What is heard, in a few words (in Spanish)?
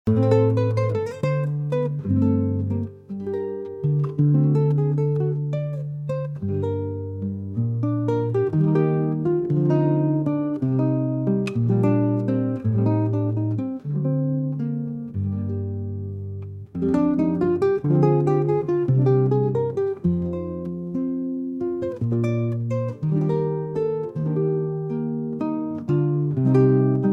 Aires de flamenco